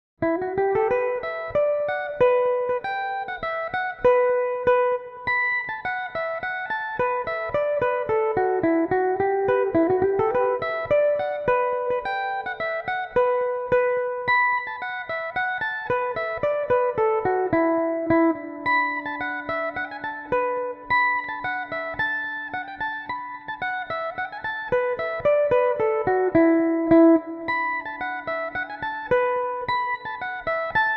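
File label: E dorian, irish mandolin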